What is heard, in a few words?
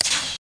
GONG UP; Disney's Animated Storybook Mulan; PS1